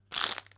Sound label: Plastic Crunch